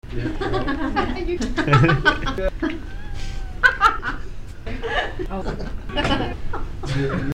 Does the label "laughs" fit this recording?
Yes